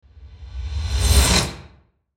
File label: Whoosh Reverse Suction, Whoosh Pan Musical Short; Blastwave